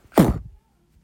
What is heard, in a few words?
beatbox kick; kicks